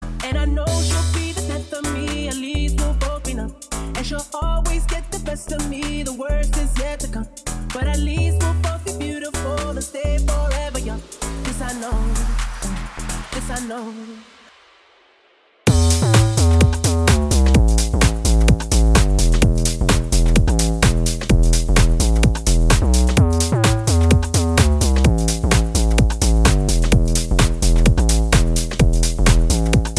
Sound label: BASS